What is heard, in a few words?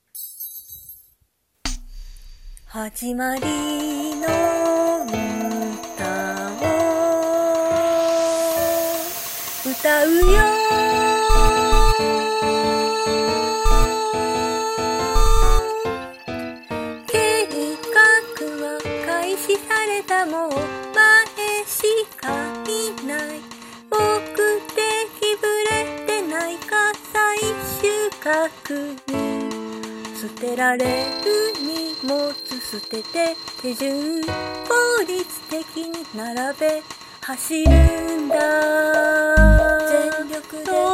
Song with vocals